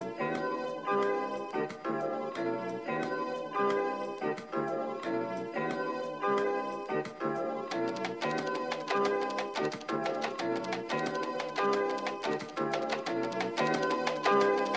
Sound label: bringing the beats